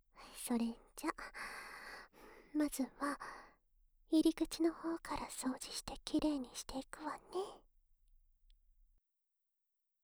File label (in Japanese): 耳かき; 萌深お姉ちゃんの耳かきタイム♪